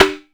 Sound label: click, Cowbell Blocks; Misc Percussion